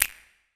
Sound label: Percussion, House Drum One-Shots; GhostHack; Advent Calendar; House Kit